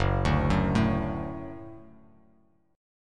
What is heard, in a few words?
Gb Minor Sharp 5th, down; Gbm#5; arpeggiated; JGuitar